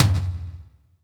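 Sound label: tom, real mega drums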